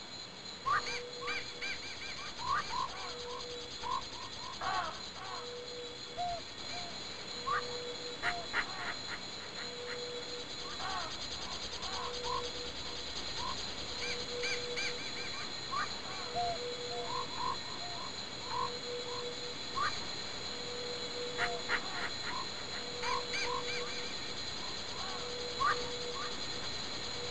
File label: Intense Jungle Ambience; Operation Vietnam; DS